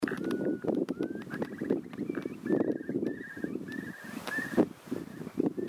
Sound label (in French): courlis; Ecosse